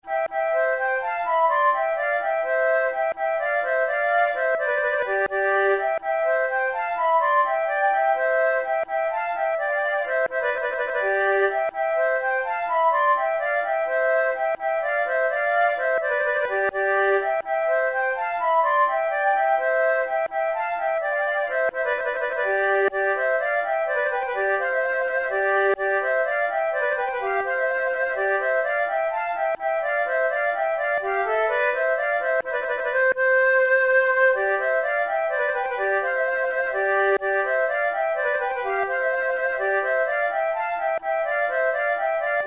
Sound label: fanfare, flute; naudot; 6 babioles op10 no2